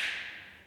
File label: school hall, impulses; NS Studios - ultra sound collection